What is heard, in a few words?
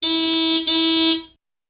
Old Car Horns Single; Various Old Car Horns - Singles & Doubles - 8 Types ( Beeps, Honks, 'aaooga', Etc... )